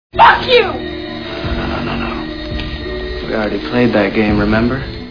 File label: scream